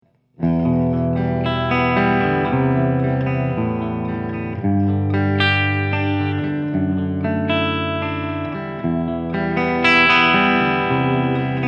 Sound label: Clean Channel